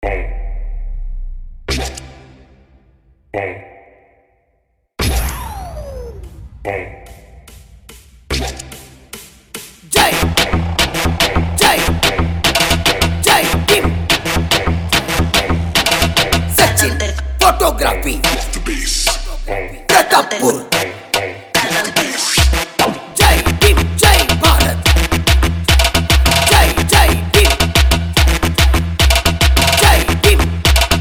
Jump Bass Trance Dance Remix; 14 April Bhim Rao Ambedkar

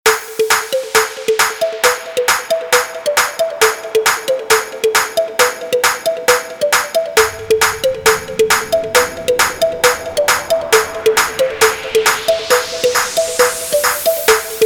Train Horn Competition Vibration Remix

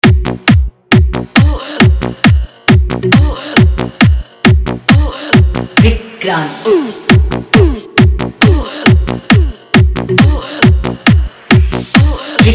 Hindi Remix Song

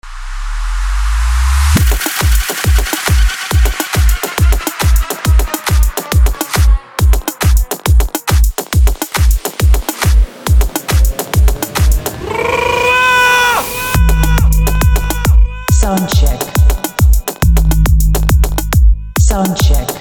Sound Check Speckar - Ganesh Chaturthi Remix

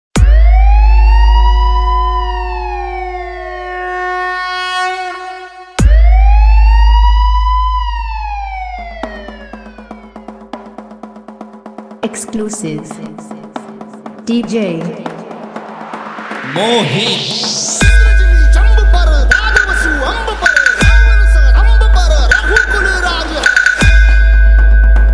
POLICE SIREN SOUND CHECK

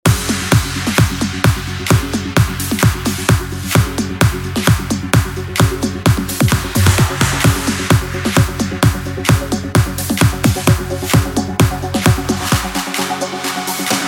Mashup Remix Song; Angry Birds

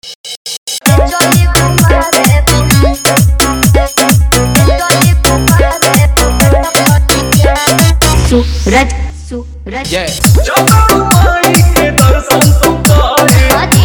Remix

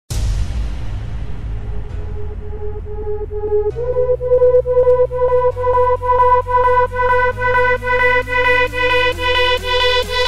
Dutch House - Remix

